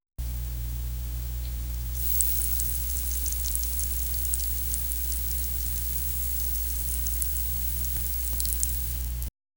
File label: a soft realistic sound of, smearing